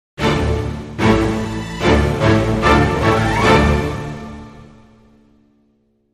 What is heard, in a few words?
Dramedy Sting